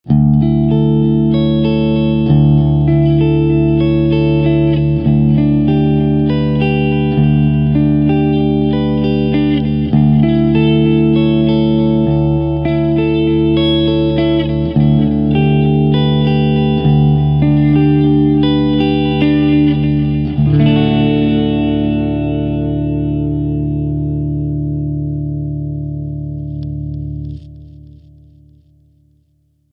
eclipse echoplexing

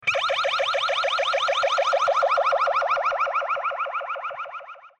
raygun

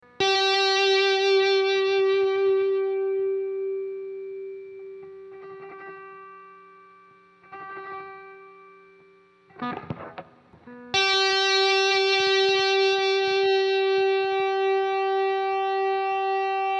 phase norm then reverse

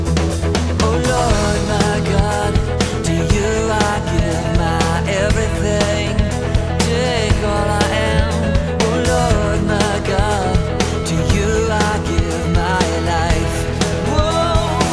delay

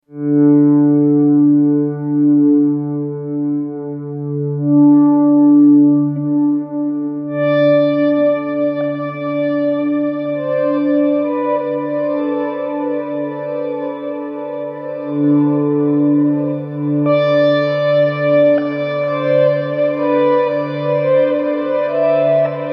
swell diff

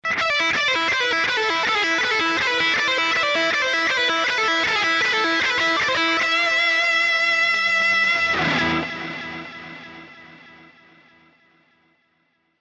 echoplex

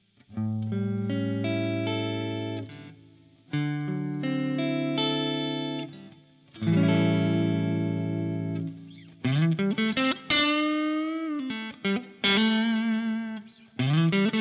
fuzz

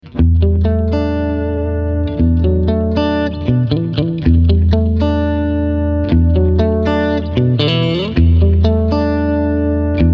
clean

